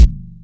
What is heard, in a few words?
KIK; Kicks